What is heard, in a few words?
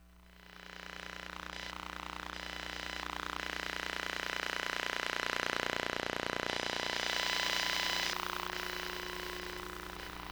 Cell Phone, electromagnetic sounds; Micro Pack; NazdyNate